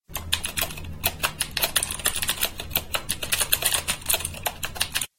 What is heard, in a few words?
Typing experience Click